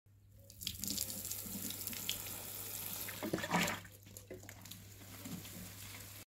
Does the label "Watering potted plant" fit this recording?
Yes